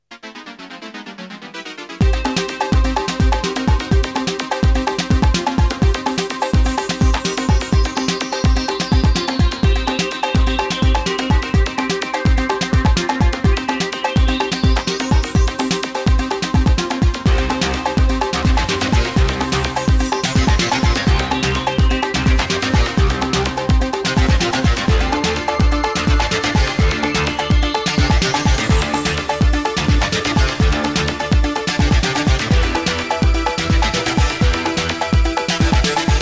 Boss Fight; Jim Power in Mutant Planet; pc engine cd

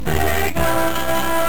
Logo; Sega; Game Gear Version

